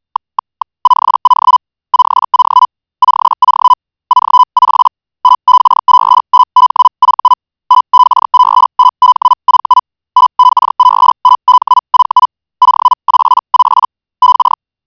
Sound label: HELL, Hobby Radio